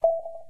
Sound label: menu out; Wii; usbloadergx